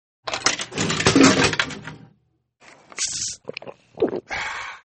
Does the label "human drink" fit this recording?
Yes